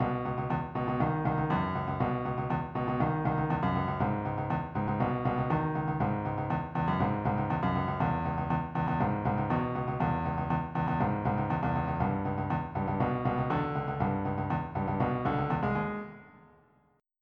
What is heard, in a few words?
motown